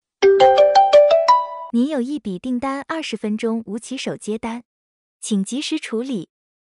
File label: over time